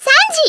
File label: clock; lemina; allthelunar